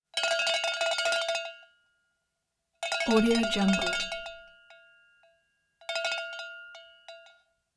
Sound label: cow bell rapid rings toggling bongs